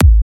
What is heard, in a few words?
kick drum